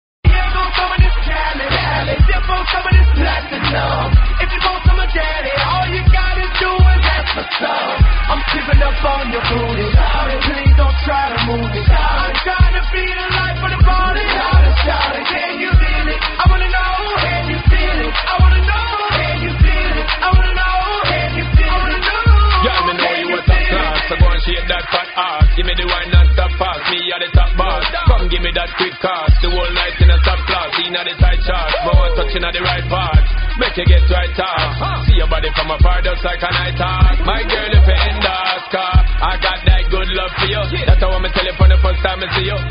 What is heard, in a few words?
funk; multitrax